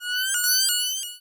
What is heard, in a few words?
Cry